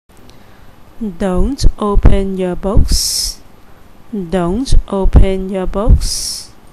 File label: Open book